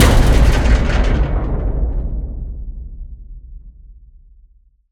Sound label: heavy metal impact factory door vibrating; sonniss gdc